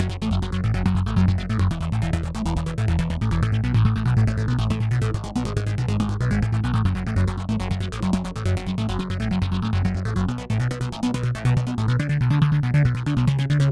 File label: Mine Bass Alone; Bust n Rush